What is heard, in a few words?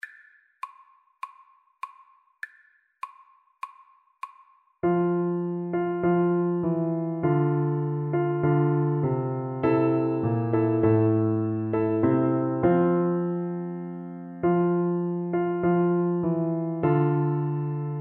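recorder karaoke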